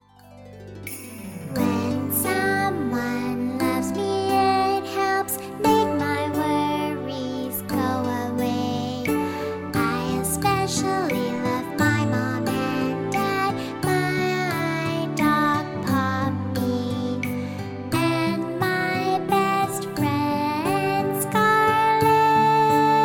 영어여 동요

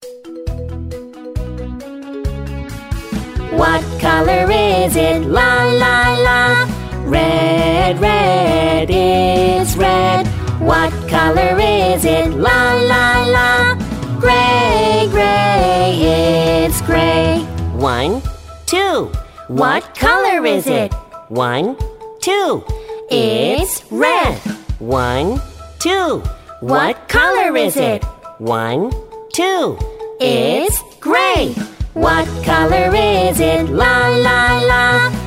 영어남 동요

